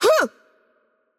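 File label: Attack Light, Portuguese; VO; bloom minigame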